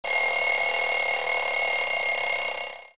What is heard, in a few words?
lgr alarm, house clocks; KABOOM! - Wild and Wacky Sound Software